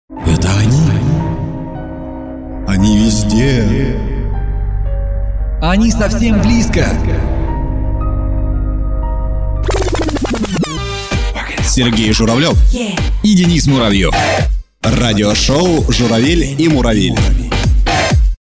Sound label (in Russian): джингл; Журавель и муравель